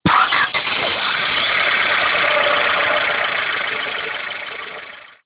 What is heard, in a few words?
gear, auto